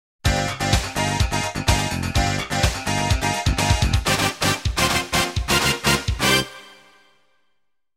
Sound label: Station Call; Sounders, Bumpers, CUES & IDENTS; DennisMusicLibrary